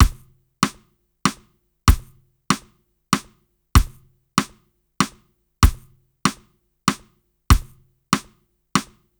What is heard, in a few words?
Andante 96 bpm